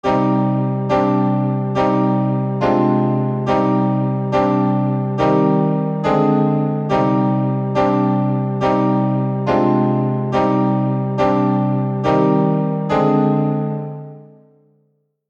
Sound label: Luxury Sample